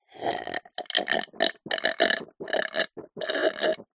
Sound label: human drink from glass slurp swallow